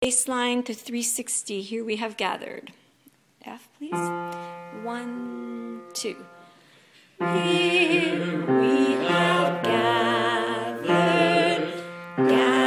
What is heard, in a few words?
Bass